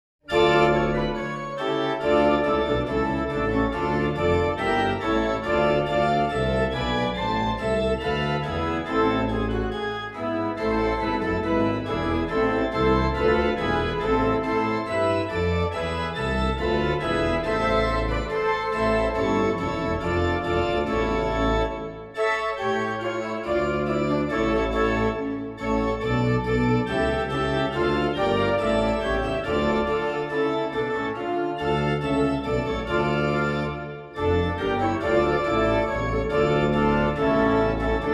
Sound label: Accompaniment Track; Sicut locutus est and Gloria Patri; Durante; Magnificat